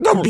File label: turkey ostrich hurt yelp